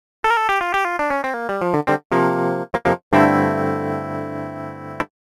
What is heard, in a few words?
ampsim; オリジナル; SONAR 2.0